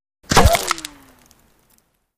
Bullet Impacts Wood I

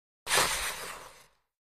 Ice Skating Quick St

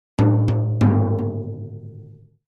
Drums Toms B Herald, drums tom

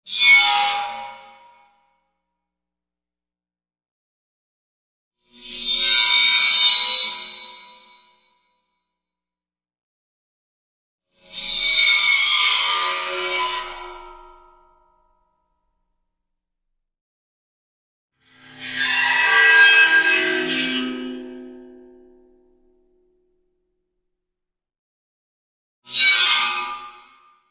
Dry Ice; Eerie Short High Metal Scream. 5x

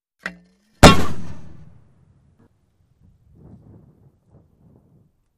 German 88cm Mortar Ha, mortars

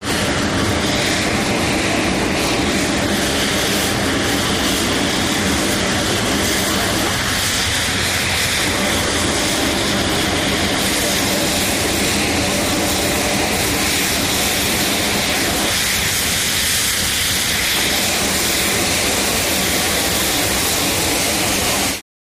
Steam Blow Down Sever, steam train

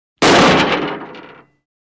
Car Fender Bang Hard M

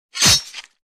Sword Or Bayonet Juic, stab

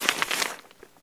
Paper Mvmt Hat

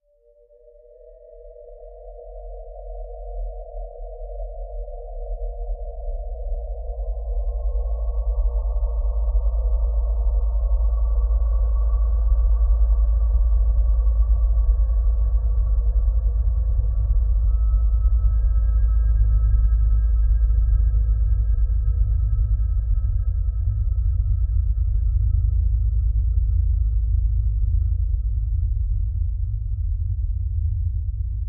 Bed Ghostly Elect Dro

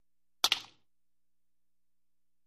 Baseball Bat Hits Woo; baseball fx & games

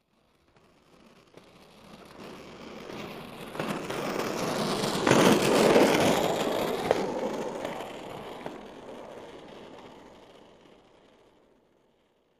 Roller Blading By

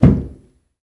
Tub Hammer Hit Dampe; hammers

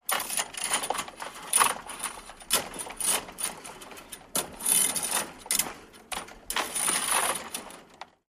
chain fence rustle, chain link fence

